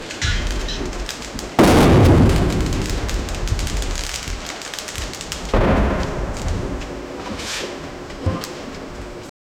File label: sudden explosion of a gas; warehouse